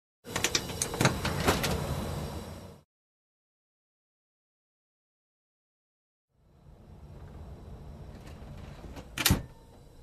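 zvuki dverej vagona tualet rakovina sliv, sounds of train car doors, toilet, sink, flush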